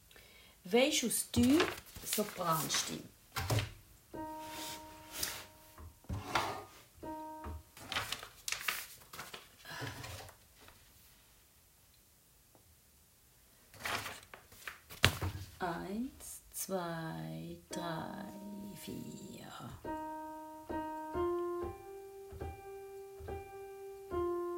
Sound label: Weischus dü Sopran